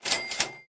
Gold Buy; Blockman Go; Android

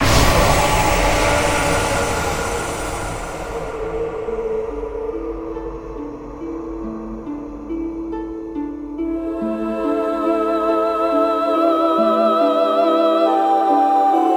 bgm death; Shin Megami Tensei Imagine gamerip

